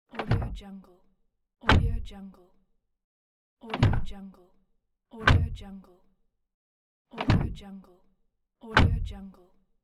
car door

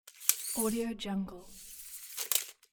tape measure

